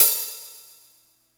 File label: Hihat Open, Yamaha PSR E305